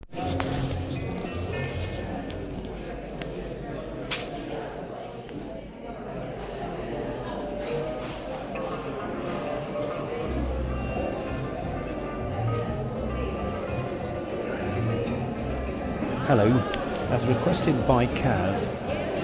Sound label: pre gig music